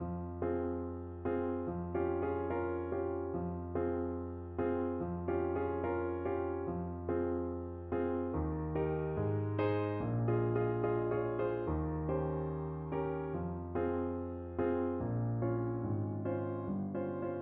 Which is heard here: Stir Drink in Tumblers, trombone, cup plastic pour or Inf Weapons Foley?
trombone